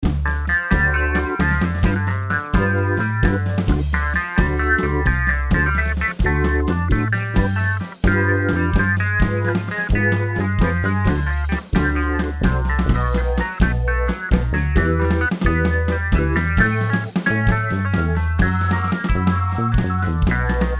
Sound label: MIDI blues; spellman studio